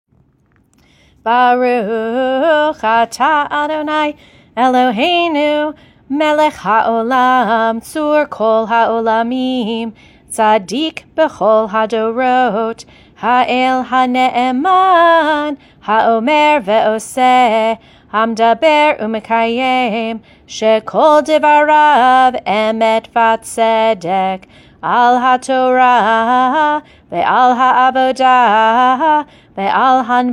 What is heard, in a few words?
Closing Haftarah blessing; bnai mitzvah; barbat mitzvah